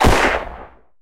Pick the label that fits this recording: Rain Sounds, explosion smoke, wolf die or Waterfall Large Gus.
explosion smoke